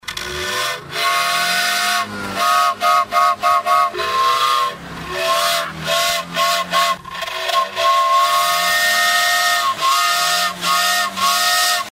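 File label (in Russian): звук пожарной машины ГАЗ 53 СССР поросёнок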